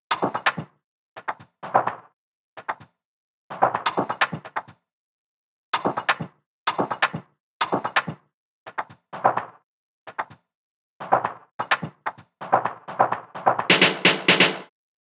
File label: house